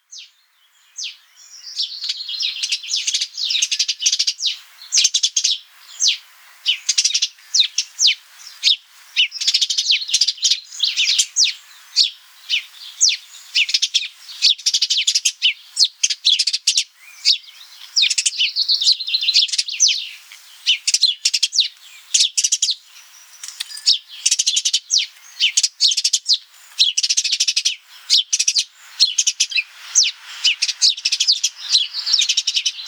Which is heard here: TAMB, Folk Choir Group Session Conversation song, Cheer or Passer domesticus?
Passer domesticus